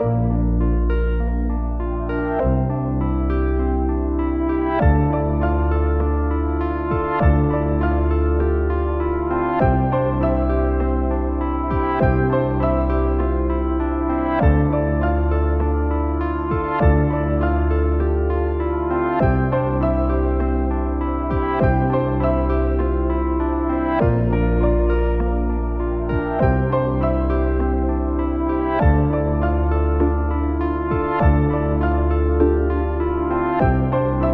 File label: No Pads